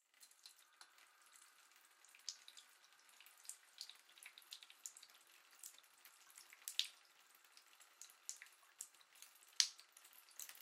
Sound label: Household Bathtub Fill Up Water; Bathtub Fill Up Slowly Drips Water; Bathroom